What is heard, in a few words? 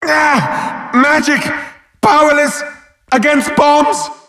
headbomb hit